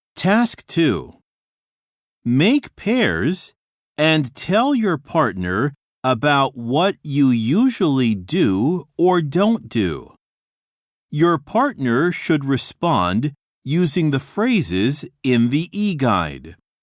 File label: harmony; 新課程英語教科書 指導用音声CD